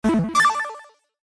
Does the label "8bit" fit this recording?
Yes